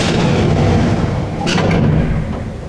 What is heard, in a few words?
elevator large stop